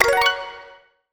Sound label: correct